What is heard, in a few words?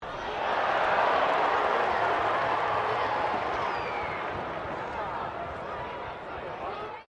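crowd 15K Rugby World Cup cheer low, Rugby world cup game; Crowd Sound Effects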